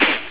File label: flap